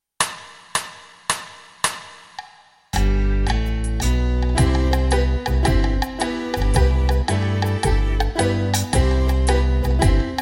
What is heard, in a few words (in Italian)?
Piano tutti